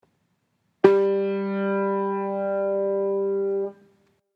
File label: alto ii g